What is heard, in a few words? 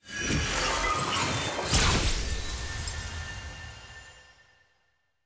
celebrate upgrade; littlelegend